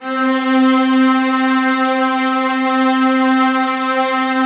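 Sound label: violas sustain c4; Sonatina Symphonic Orchestra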